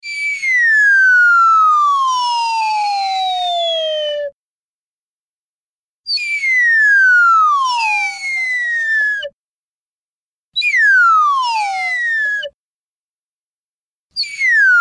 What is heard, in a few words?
comical down